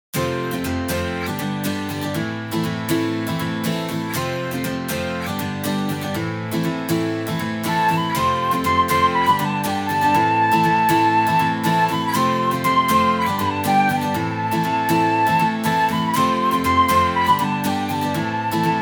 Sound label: INSTR; مجموعة عمانوئيل